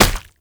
Proto Infested Step; Enemies; Steps; Warframe; Brachiolyst

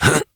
Jump Adult Operator; Warframe; New War

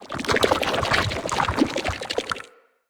Corrupted Slug Foley Move; Creatures; Movement

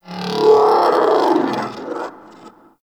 Metallic Creature Growl; Warframe; Tau

